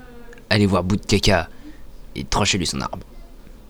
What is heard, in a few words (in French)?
ORDRE TECHNO